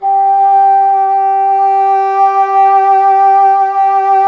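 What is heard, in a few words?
wind bassoon G0; Miroslav Vitous; Solo Instruments VOL-1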